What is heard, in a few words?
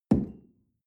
coffee place tablecloth soft